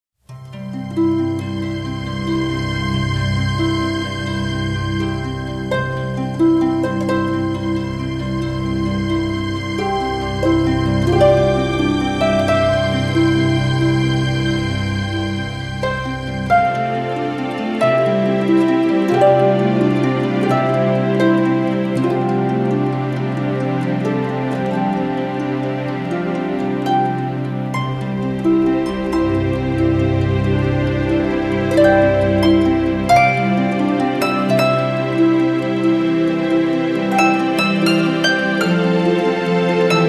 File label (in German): Harfen